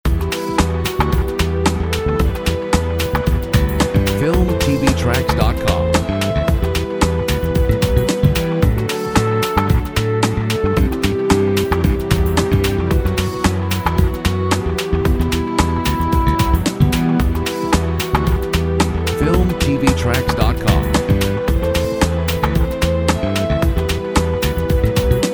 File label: hip hop